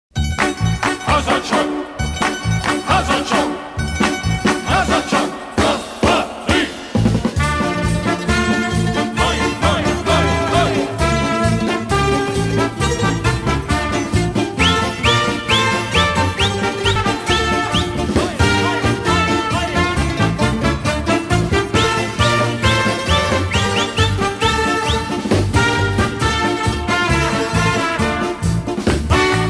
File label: music